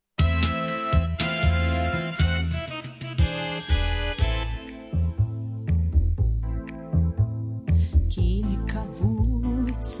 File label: Ukrainian song; Skoryk; words Rostyslav Bratyn; serenade